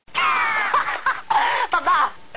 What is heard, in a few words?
laugh